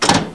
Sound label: reel stop; VEGAS Girls